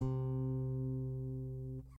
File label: string4 c2